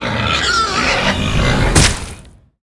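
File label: guardian snake death; monster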